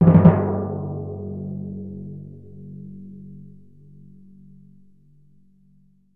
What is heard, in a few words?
TMP, Synclavier Percussion; ILIO; Synclavier Percussion 2; World & Orchestral